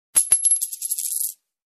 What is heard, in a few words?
Coin Fall